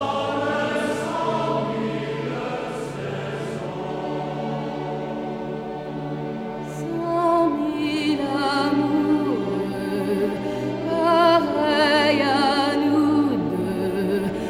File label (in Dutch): koor